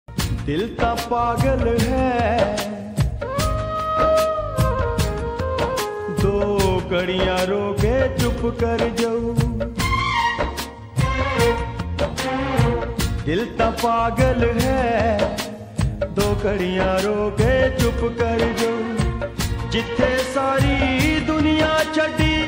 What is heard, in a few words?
punjabi song ringtone